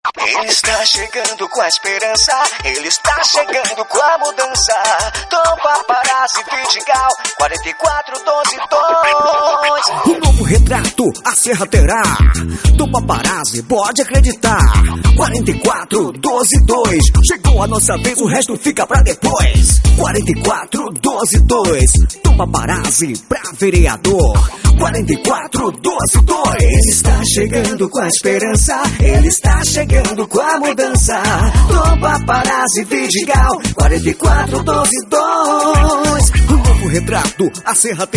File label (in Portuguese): Jingle politico